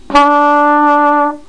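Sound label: flugelhorn, notes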